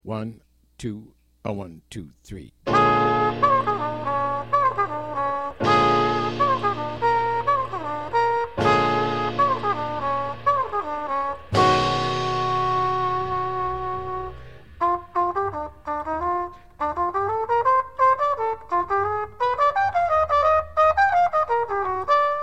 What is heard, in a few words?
minus bass